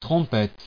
trompette

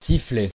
siffler

